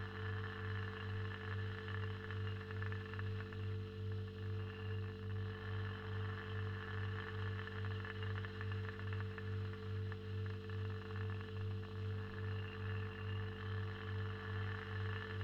fridge; devices accessories utilities; NS Studios - ultra sound collection

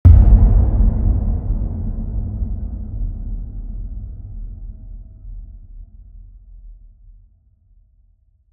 HIT Low, Cinematic Hits Designed

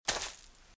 Footsteps Leaves Walk Left; RadwinIsland

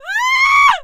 woman scream; DeadFright

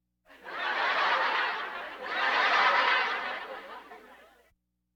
Laugh tracks

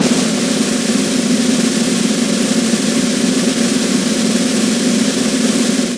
snare roll; best service Blue Box; percussive fx

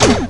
Menu Pinball Bumper; Menu FX

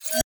Focus Enter; AudioWizards

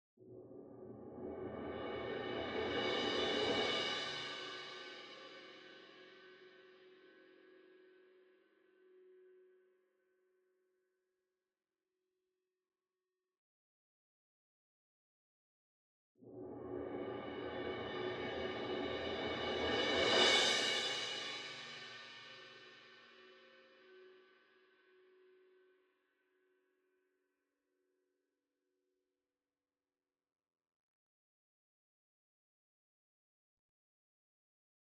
CYMBAL Crash Swell Soft Beater Far; boom library; Cinematic Strikes Construction Kit

